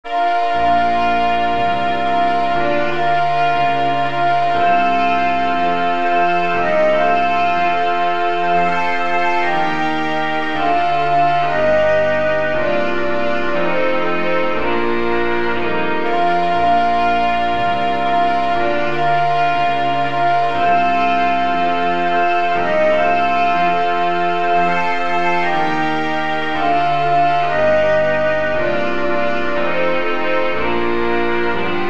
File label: sans trombone